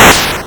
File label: Hard PCM Chip; chiptune